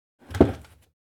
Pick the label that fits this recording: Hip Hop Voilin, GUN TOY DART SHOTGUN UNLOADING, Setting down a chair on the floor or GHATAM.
Setting down a chair on the floor